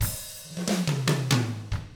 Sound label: Double Platinum Drums, COUNTY